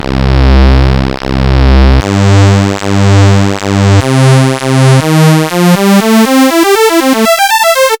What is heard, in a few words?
Scorched Saw; Retro Noise 3 Instruments